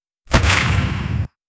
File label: the sound of a bullet, wild west